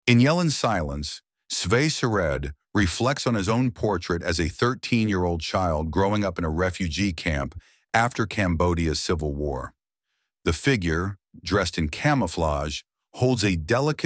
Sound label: yell